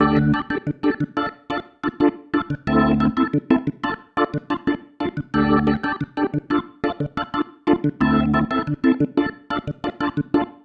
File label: Pattern, Organ